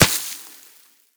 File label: sand impact bullet